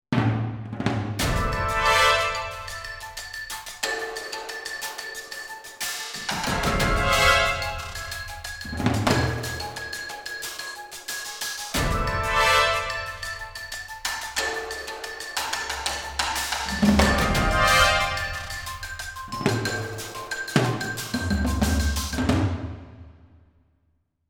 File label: Harmonic Splats, orchestration